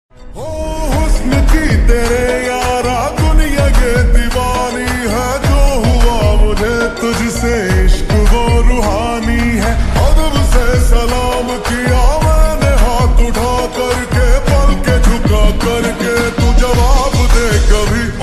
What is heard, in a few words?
Slowed Reverb Ringtone; Sikandar